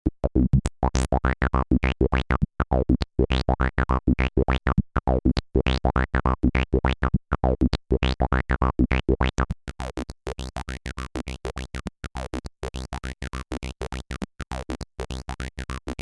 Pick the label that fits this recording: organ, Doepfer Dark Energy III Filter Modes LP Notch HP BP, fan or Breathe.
Doepfer Dark Energy III Filter Modes LP Notch HP BP